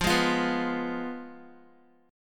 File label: F Diminished Guitar; x,8,8,10,11,x; Fdim strummed; JGuitar